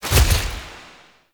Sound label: Phase Through Impact; Enemy; Abilities; Characters; Circade